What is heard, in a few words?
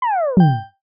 map pin drop